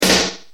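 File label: snare dm100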